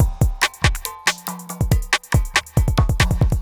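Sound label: industrial 140 kit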